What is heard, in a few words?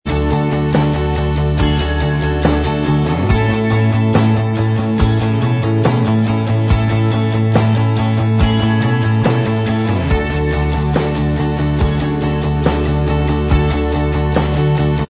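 instrumental track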